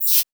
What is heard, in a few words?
Electric dis splash